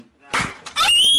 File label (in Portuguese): grito